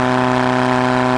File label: Loop, Engine, buggy